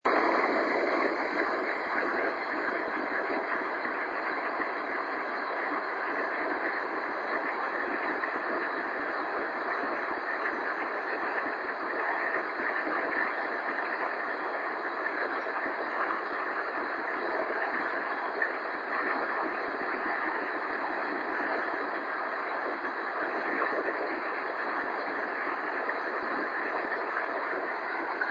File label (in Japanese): nakigoe